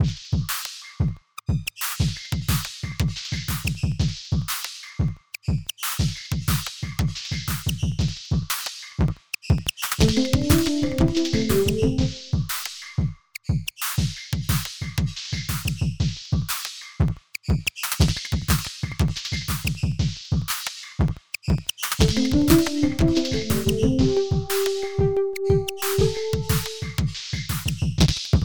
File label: wavesynths waltz; reaper